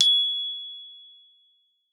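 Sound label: GLOF G#5, glockenspiel; Xsample VOL-4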